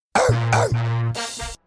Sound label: arf